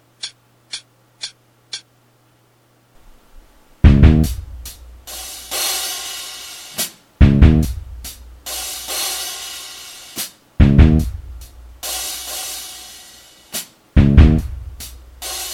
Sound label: intro backing, 142